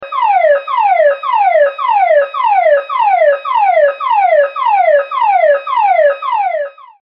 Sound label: Fire Alarm